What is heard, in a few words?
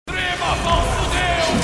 echo